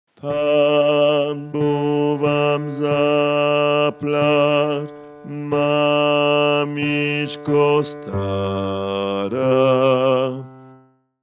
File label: pan buh vamzaplaf basse